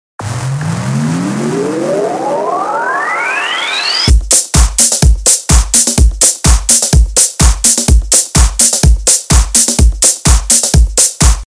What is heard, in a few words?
Romanian Mix